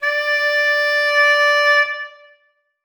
bass clarinet d5; Sonatina Symphonic Orchestra

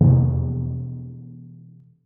timpani d#1, Percussion; Sonatina Symphonic Orchestra

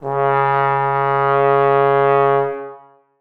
bass trombone c#3; Sonatina Symphonic Orchestra

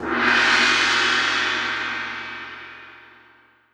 tamtam, Percussion; Sonatina Symphonic Orchestra

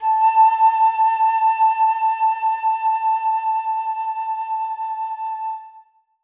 flute a4; Sonatina Symphonic Orchestra

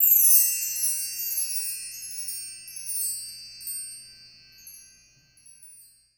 bar chimes, Percussion; Sonatina Symphonic Orchestra

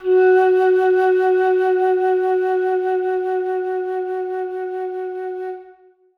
flute f#3; Sonatina Symphonic Orchestra

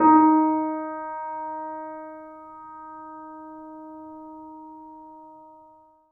piano piano d#4, Grand Piano; Sonatina Symphonic Orchestra

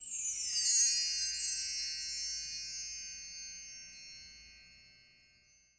bar chimes a, Percussion; Sonatina Symphonic Orchestra

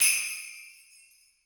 sleigh bells f, Percussion; Sonatina Symphonic Orchestra

